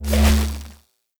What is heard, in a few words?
Venus Fishing Spear Charge; Weapons; Warframe